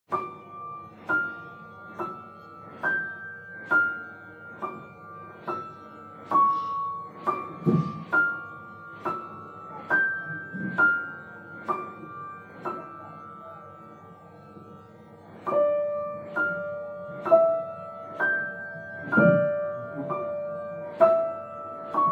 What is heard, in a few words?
piano Denoised